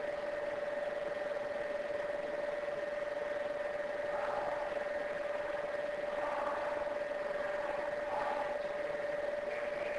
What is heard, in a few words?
Unexplained Breathing